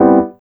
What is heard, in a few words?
CHORD, 160 BPM, Drum & Bass; Ueberschall; Drum & Bass Flash Trackz